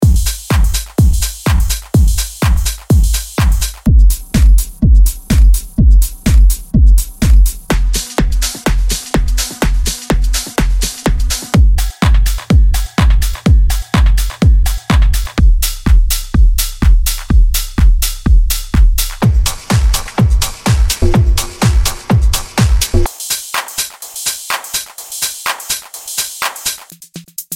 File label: techno